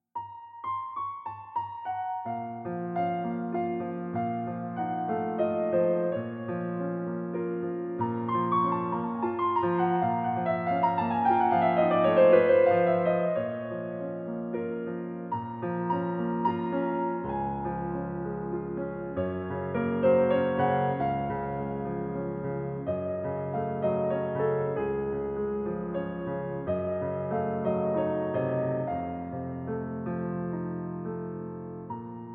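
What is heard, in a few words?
C Bechstein Recording, Pianoteq; SennHD595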